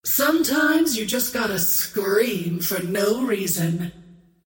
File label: scream whiff; queenofpain